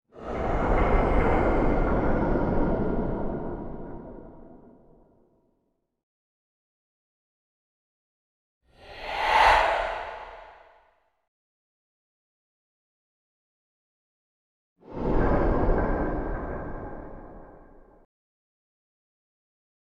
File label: dark darkness